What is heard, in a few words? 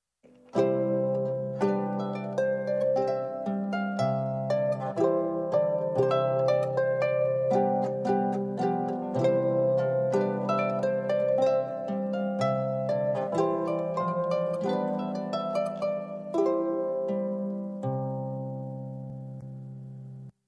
trumpet